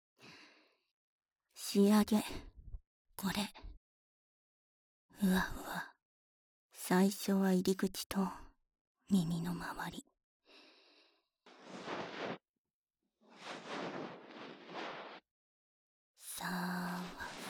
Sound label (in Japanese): 【右】梵天(終), 耳かき, ASMR; 耳かき小分けトラック